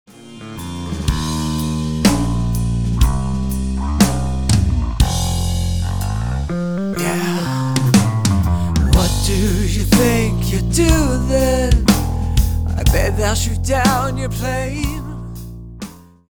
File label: Backing Track